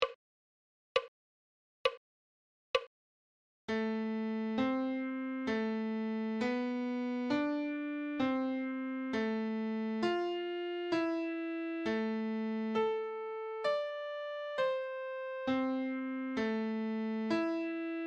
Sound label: treble clef adagio